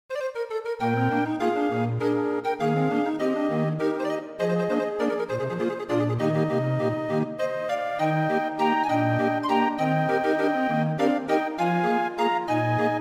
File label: Snow Waltz